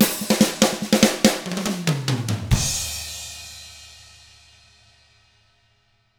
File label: rock 6/8, drums; ILIO; Double Platinum Drums 1